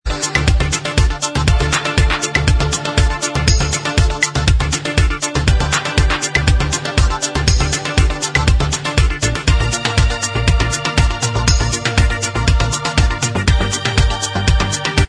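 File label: instrumental, backing tracks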